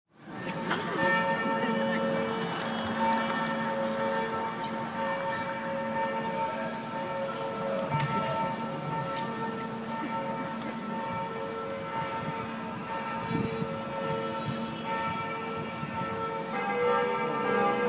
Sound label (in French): 1ere volée, sonneur de cloches; NDP